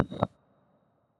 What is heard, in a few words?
Pickup; Martian Chess